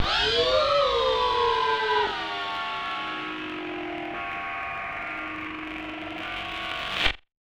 Rando Syn, malfunctioning synth samples, Random Synth Failure